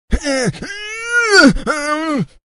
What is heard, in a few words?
straining